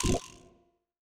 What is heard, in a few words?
No Stamina